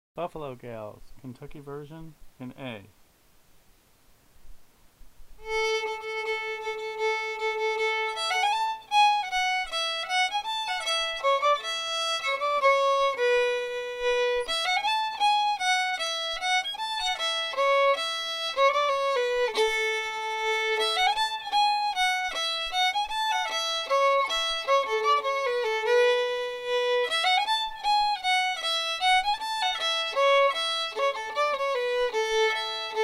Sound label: in A fiddle; Buffalo Gals